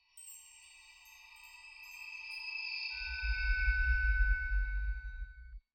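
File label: little bells; pantocrator video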